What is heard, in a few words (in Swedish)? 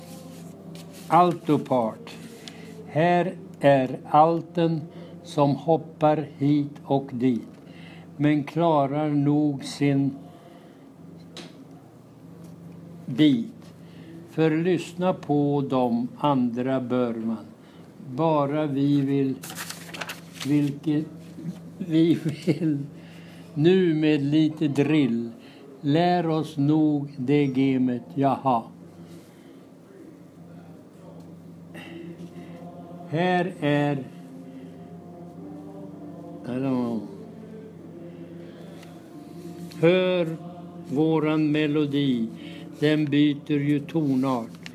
Körtrall Alto